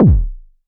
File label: KCK, Roland SH-09 synth drums